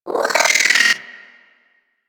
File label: Hyena Micro Vocal Angry; Warframe